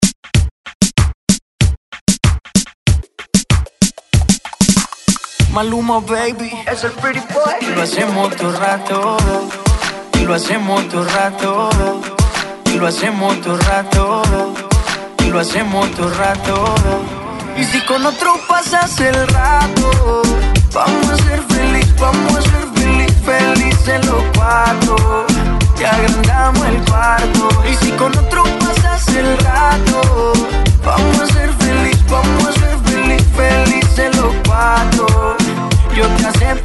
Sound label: Reggaeton 95 Bpm